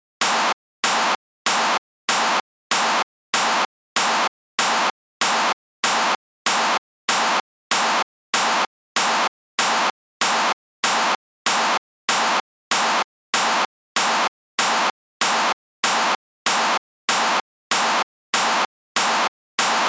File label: pattern clap